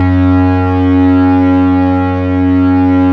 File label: F#1 SYNTH, SYNTH ORGAN; AKAI S-Series CD-ROM Sound Library VOL-7